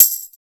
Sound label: Tambourine; Percs